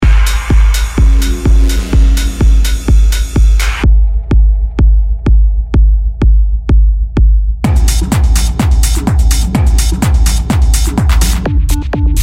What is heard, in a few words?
dark warehouse techno